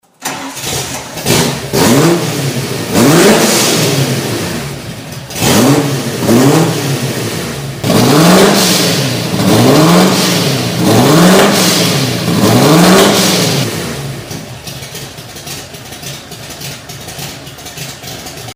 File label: Ford GT40